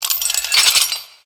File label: Mechanical Arm Attack